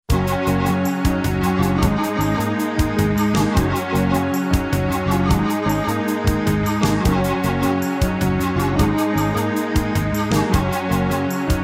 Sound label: arp